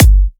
Kick, Psytrance; One Shots

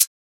HiHat, Psytrance; Drum Shots

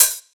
Hihat, Psytrance; One Shots

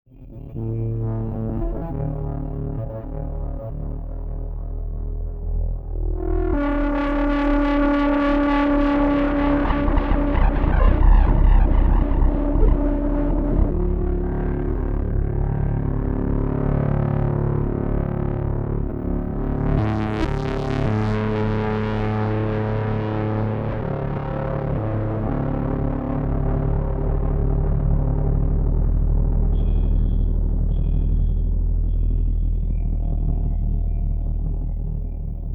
drone grainy feedback pulses

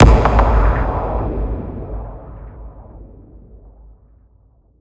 Impact; Singomakers; Infected Psytrance; Impacts

